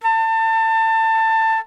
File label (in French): flute Traversiere La4